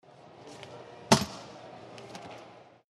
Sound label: Stamp Ticket Passpor, airport